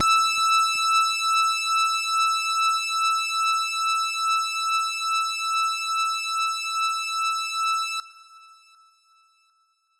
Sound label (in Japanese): 音程 女性 E5